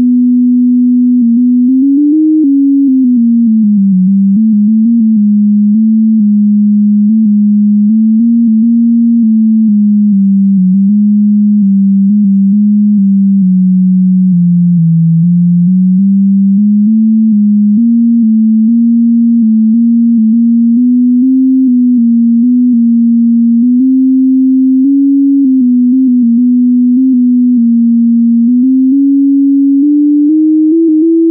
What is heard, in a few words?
ASX200, Sonification